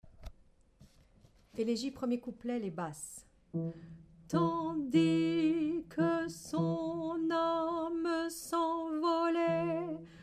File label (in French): Basse